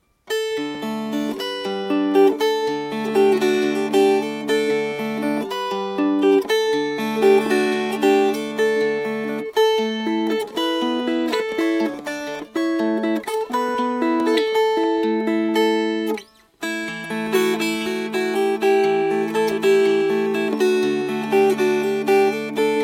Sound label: O du frohliche Fingerpicked